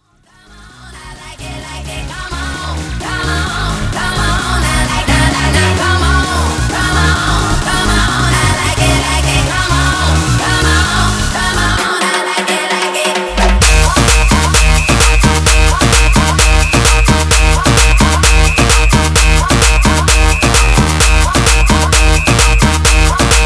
tribal remix 130 bpm; Prodeejay Remix

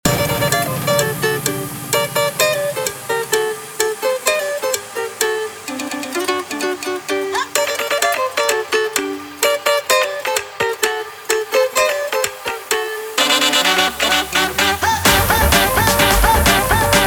tech remix 128 bpm

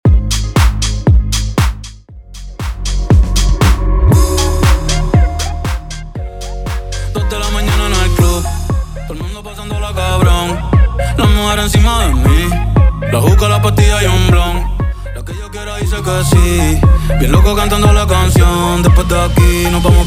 Intro Outro 118BPM

